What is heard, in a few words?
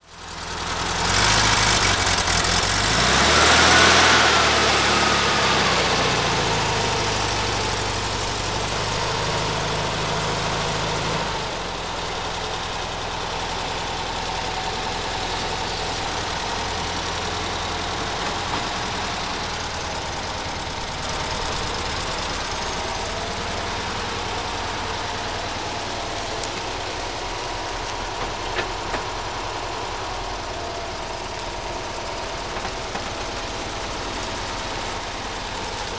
stock engine, vw